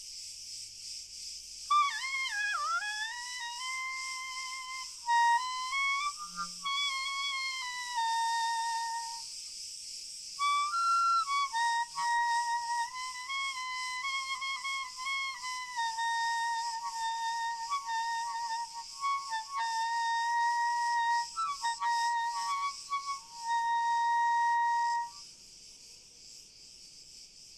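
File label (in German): Frei Obertone Spiel Grillen Chor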